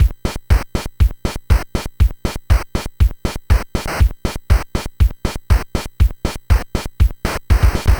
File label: NES Drum Loop; Sonic Foundry (Sony Creative Software)